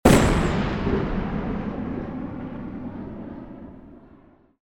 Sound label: explosion